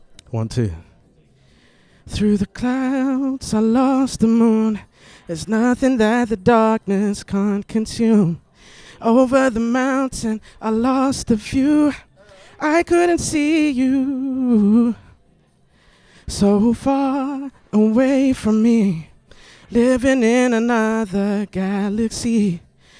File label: vox; TRUTH-HZ; Crowdsourced Sample Pack; Crowdsourced Live Stems